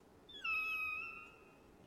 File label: Creature Sounds; BOOTES